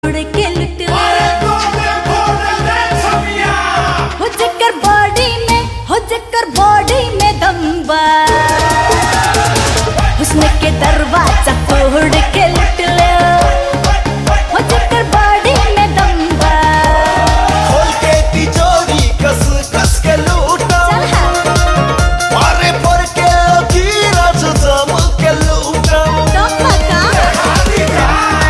Item Song

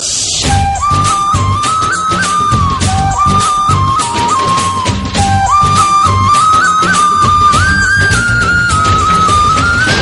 Flute Theme

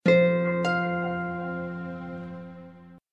Notification; Movavi

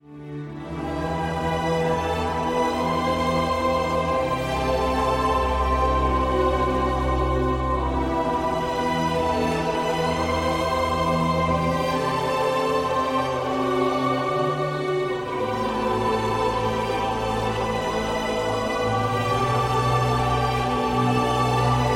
Touching Tune; Dhoom3

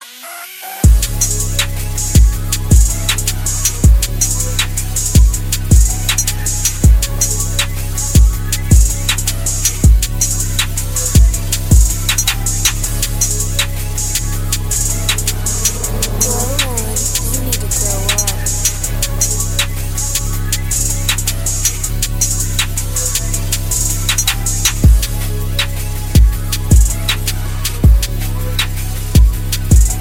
Lil Ui Beat

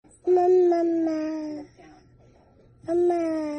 Baby Calling Mam Mam Ma

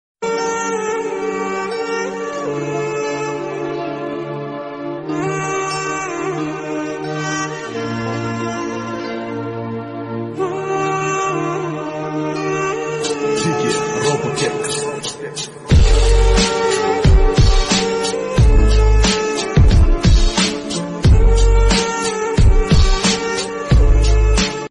sad bgm; joker

